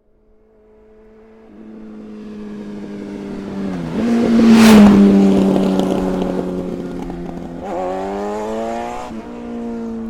transport motorcycle honda hornet race bike pass fast; fork media